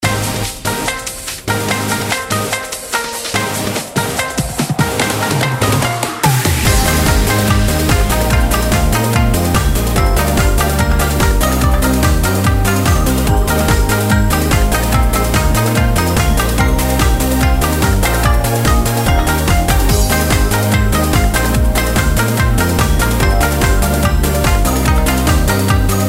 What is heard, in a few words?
Raid; Mist Train Girls